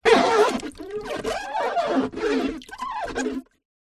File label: foley plastic tub water shallow fingers rub squeak wet water movements